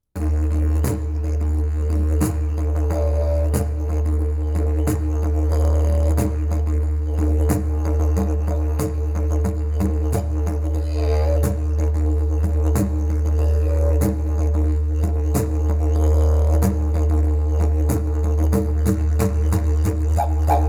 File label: didge